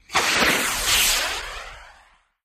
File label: Solid Fuel Rocket: Launch And By. Stereo; small rockets